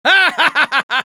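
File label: laugh happy, Spanish; Risa feliz 2; Spy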